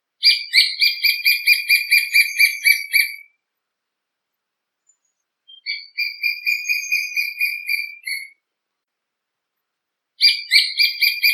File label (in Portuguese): Canto do arapacu grande